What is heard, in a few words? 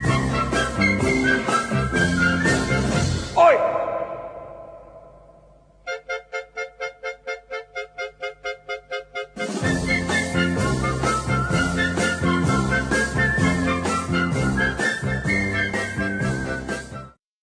whistling